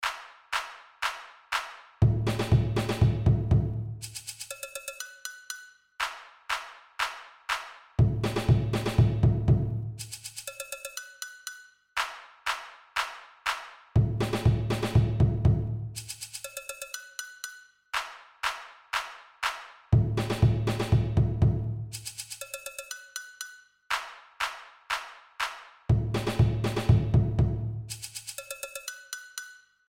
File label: break